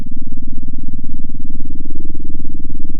pop, sound of a small motorcycle

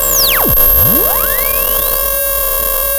sound of a bike bell

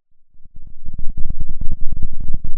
Sound of a thunderstorm, center

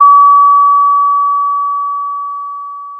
Sound of a school bell

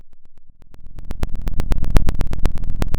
Sound of a fire crackling

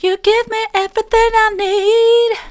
you give me everything i need soft, vocal

